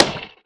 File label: axe mining; Factorio